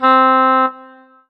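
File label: Korg 01W English Horn C4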